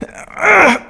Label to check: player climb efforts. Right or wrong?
Right